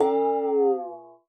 WATER, PERC; Xsample VOL-9

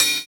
Cymbal, jungle breaks drum sounds; ORIGINAL JUNGLE BREAKS

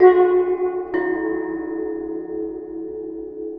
Waterphone Hard Mallet; Sonic Foundry (Sony Creative Software) - Ambient Atmospheres & Rhythms; Ambient-Metals

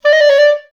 D4, orchestra; Best Service; Peter Siedlaczek's Advanced Orchestra

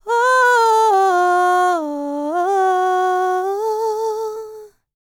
LICKS, Vocal, R&B; ILIO; Dance; LT R+B

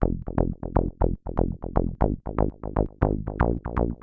SH101 Bass Loop (120BPM), retro house

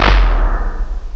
SYM TOM; DRUMS; CMI Sound Library Rev 1.3

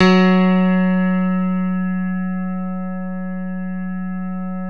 CP-70, CP-70 El.Grand; E-MU Producer Series Vol. 2 – More Studio Essentials

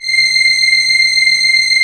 SYM STR C 7, Korg; Back In Time Records; Korg Universe VOL-1; P3+SYMPHONY

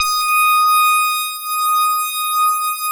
BURST; Big Fish Audio - First Call Vintage Keyboards

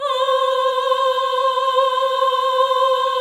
VIB F C5B -R, Symphony of Voices; Spectrasonics

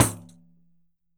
Roland MV8800 percussion; MVPERCUSSION

